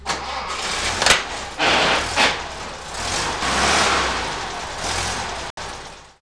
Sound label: start crash box, vw